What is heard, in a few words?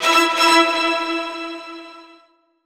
Disco Fever